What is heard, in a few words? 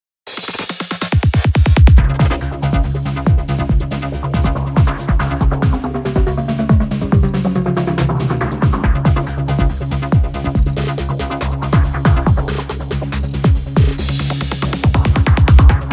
TRANCE HIGHWAY, impact percussion